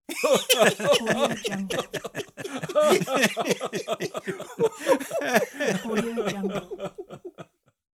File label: three men laughing